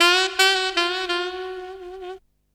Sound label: Sax Lick; Drum & Bass